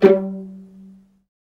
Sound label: violin A; ILIO; Synclavier Strings